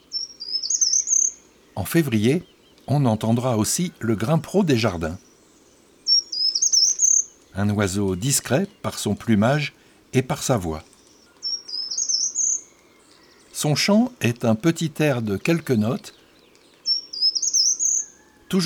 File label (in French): Le Grimpereau des jardins; Les 4 saisons des chants d'oiseaux